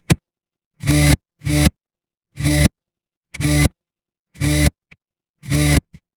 mechanical gear

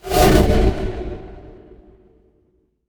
magic scifi teleport

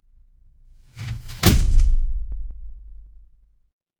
transition impact hit

